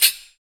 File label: chechen close, cymbals gongs